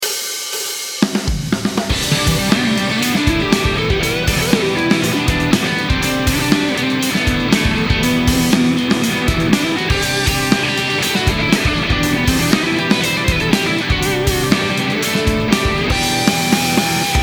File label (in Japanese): HX STOMP ソロ